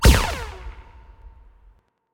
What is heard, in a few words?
Scarab shoot